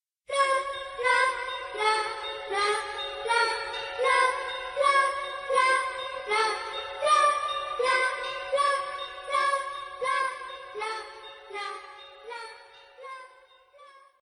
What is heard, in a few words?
desktop login